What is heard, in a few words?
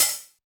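Hi-Hat Open; Yamaha DJX-2B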